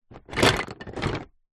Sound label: leisure toy train wooden track pieces in plastic box movement